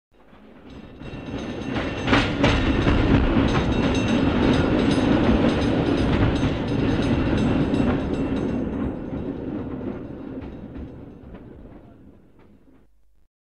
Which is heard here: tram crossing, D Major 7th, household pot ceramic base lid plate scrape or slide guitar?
tram crossing